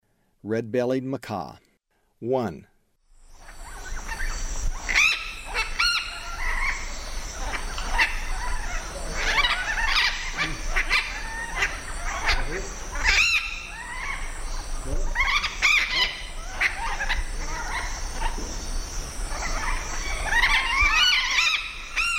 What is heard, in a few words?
Red-bellied Macaw; S. America; Eastern Lowlands